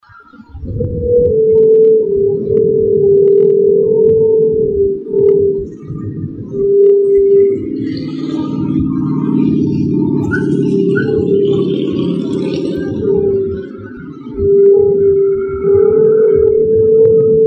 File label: wind pipe